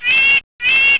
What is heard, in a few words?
nuthatch